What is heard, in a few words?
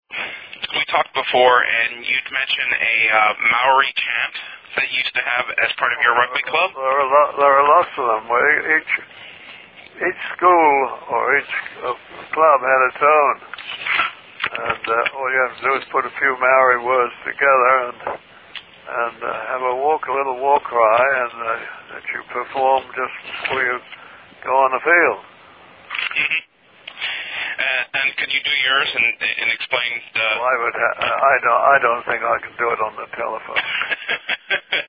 Maori Chant Used By Rugby Team Before Went On Field